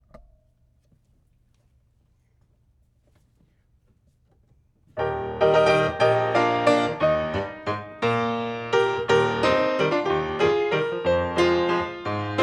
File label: Spanish 64bar; Raymonda